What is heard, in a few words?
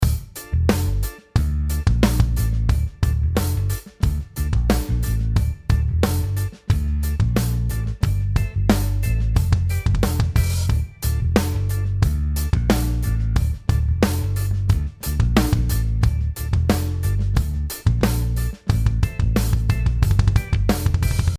Kick fills